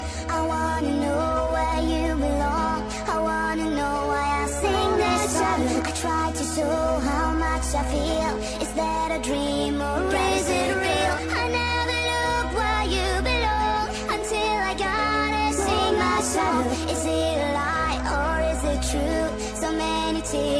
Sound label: Angel Vocals